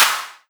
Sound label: Clap Noise Clap; Saltbearer; pitchglitch